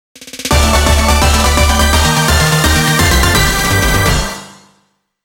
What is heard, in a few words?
Boss Battle (Victory Fanfare)